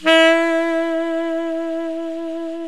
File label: BREATHY SAX; OMI - Universe of Sounds